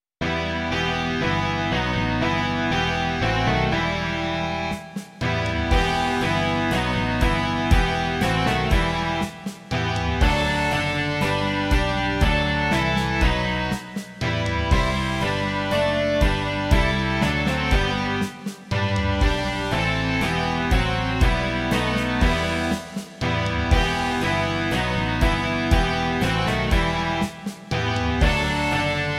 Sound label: rock